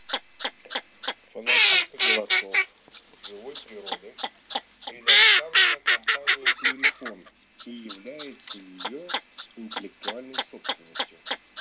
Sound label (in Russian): Широконоска Mestolone; plurifon